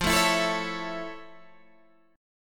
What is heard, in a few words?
F Augmented Major 7th F Guitar Open G